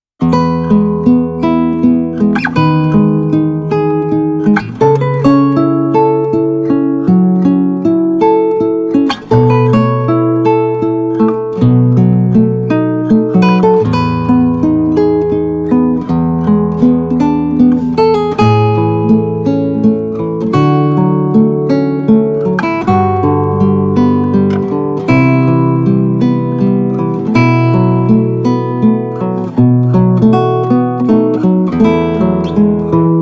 Guitarist, Spanish Guitarist; Romantic Classical Solo Guitarist; Garston Entertainment

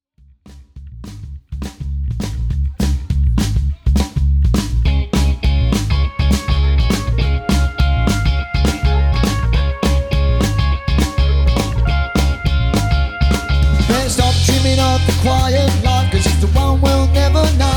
Rock, LIVE

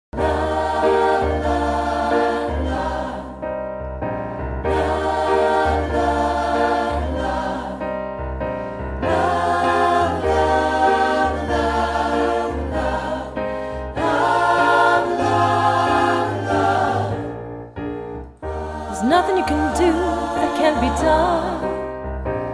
Gospel Choir; The Gospel Choir

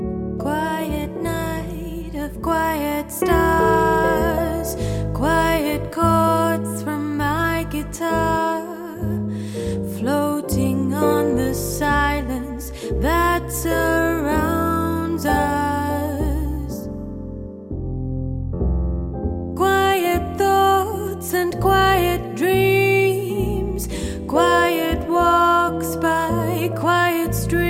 Swing

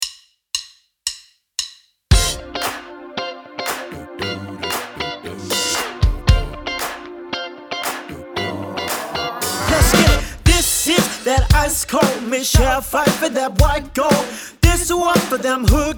Soul Band; JBs Soul Band; OJs Soul Band

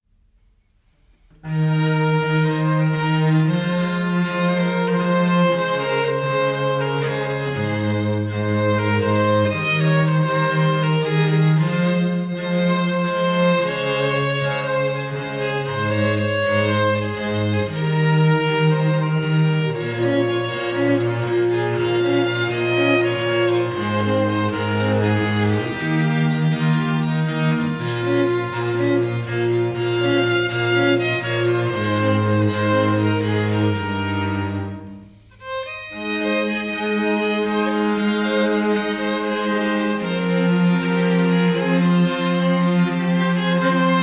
String Quartet; Manchester String Quartet; Many Strings Quartet

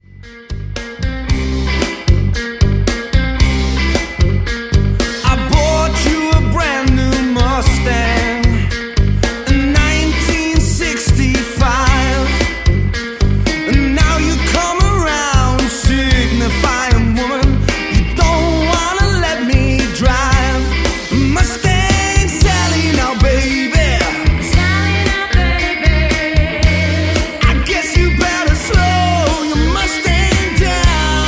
Amplified band - studio sample

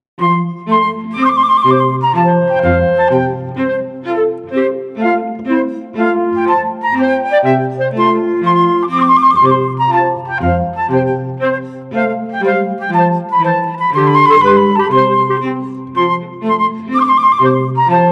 Classical Trio Trumpet Tune; J Clarke

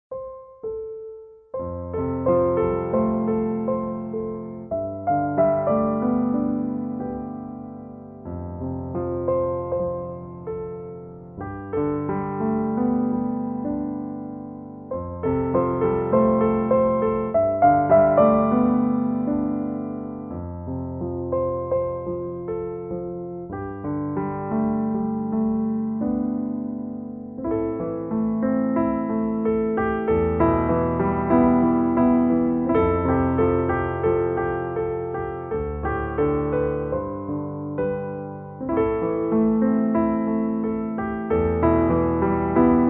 Solo Pianist

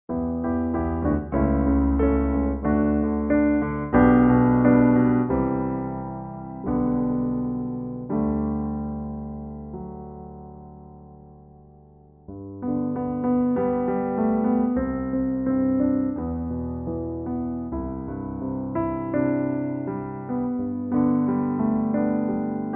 Solo Pianist

